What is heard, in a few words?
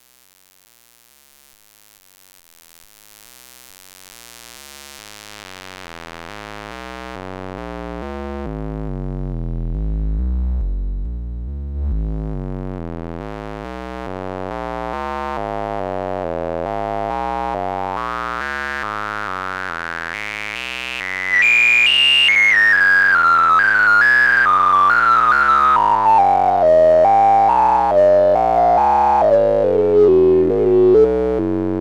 Polivoks BP